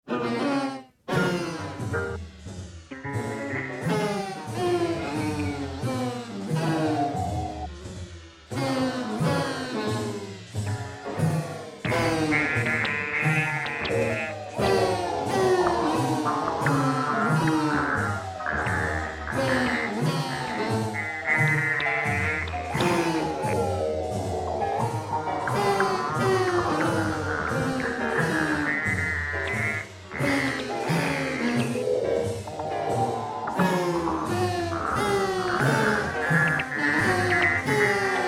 ensemble

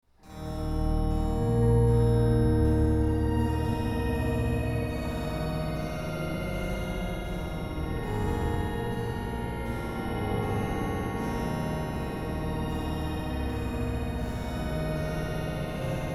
Bach orch

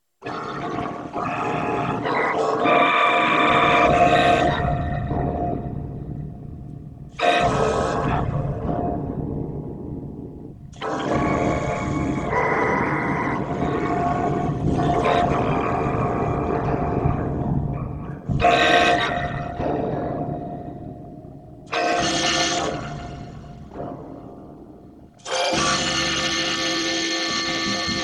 ensemble

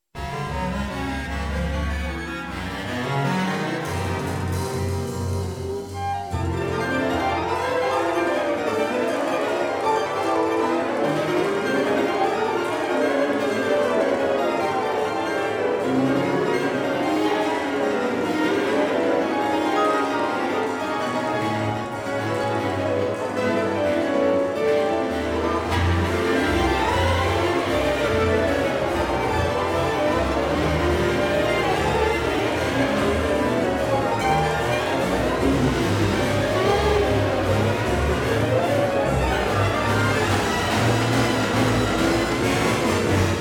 orch

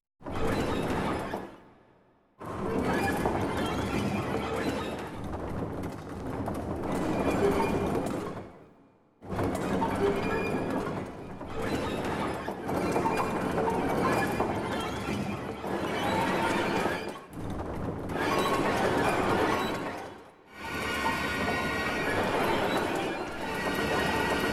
classical

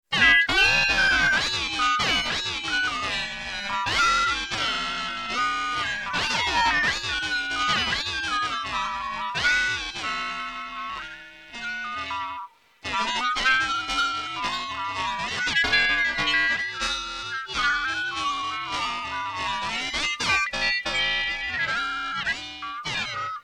solo Sax

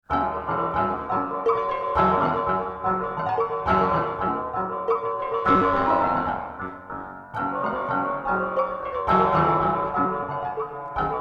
forte piano; Mozart

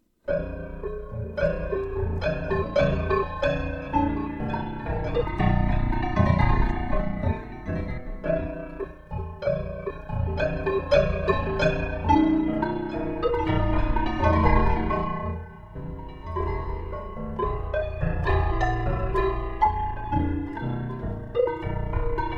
forte piano

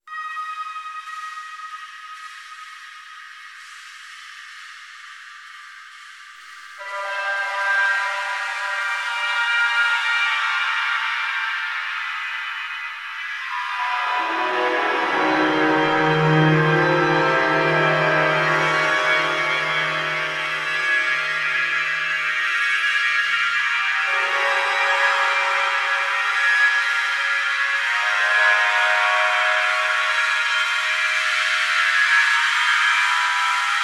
orch

